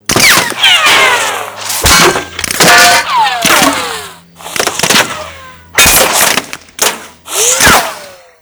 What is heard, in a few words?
Hedge Impacts